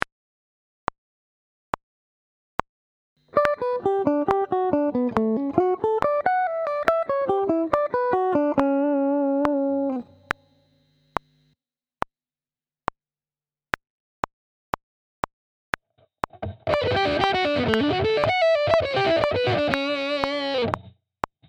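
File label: Sweep Picking, guitar; Sweep Picking Part 3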